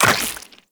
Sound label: Step Goo, Footsteps, Walk; Warframe; Tenno